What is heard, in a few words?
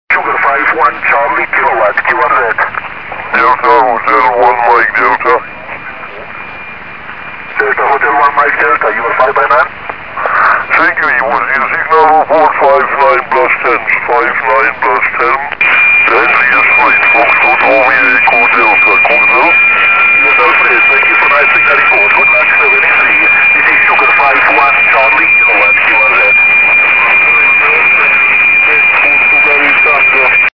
helikal